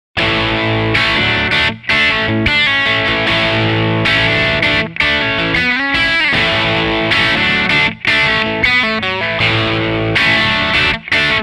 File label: Rhythm crunch